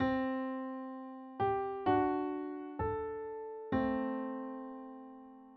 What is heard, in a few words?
Melody